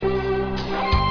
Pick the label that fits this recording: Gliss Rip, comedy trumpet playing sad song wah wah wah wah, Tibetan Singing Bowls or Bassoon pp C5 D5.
Gliss Rip